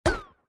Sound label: Mouse Shoot; Fancy Pants World 1 Remix